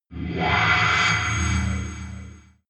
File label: alien metallic scream ring reverb; aliens; soundpool